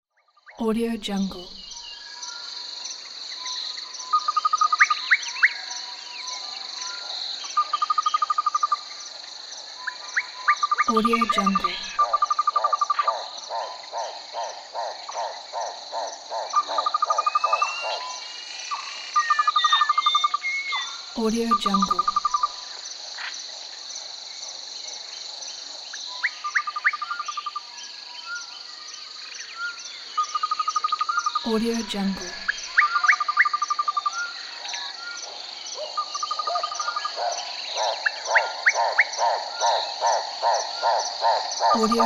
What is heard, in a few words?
jungle ambience